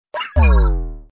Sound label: Disarm